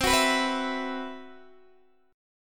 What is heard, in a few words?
C Major 7th Flat 5th C, Guitar DADGAD